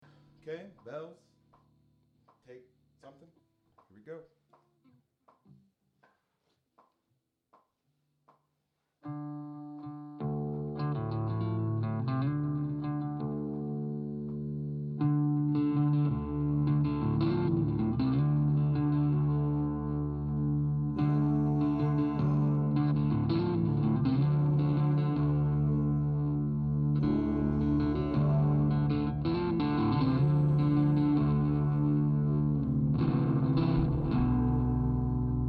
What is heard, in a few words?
bells